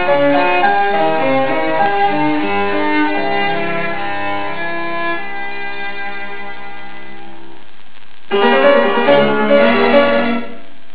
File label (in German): Klavier Quintett